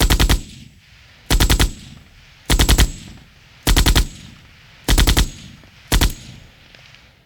Gun, Machine, M60, Short Bursts; gun and weapon sounds; captain audio; fully loaded

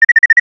Arcade Chirp, Video Game Sounds; 1001 Sound Effects

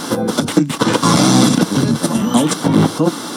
FM Radio Tune; Communications; 1001 Sound Effects

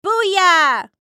widow good roll; Dice World

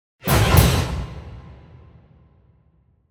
Symphonic Hit Double Sharp Chord; Hollywood Edge; sound designer's toolkit v2

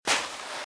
Footsteps - Sand - Dry - Walk - Right

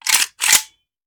Machine Gun Foley; Sound Ideas; Guns

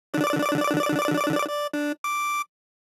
DICE Electronic C, Roll; Samples; ArticulatedSounds; DICE Sfx Party (Extended)

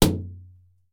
shower, impulse responses; howard fredrickson

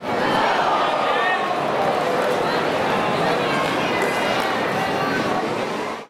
Baseball Crowd Reaction; Crowds

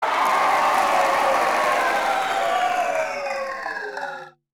Crowd Cheering; Pitch Black Audio Pong

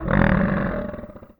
MONSTER Growl Deep Medium; MONSTERS & CREATURES; mono; Universal Sound FX

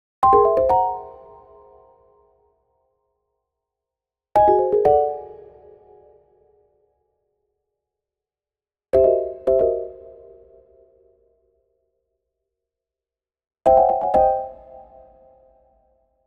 Arcade Jingle Negative

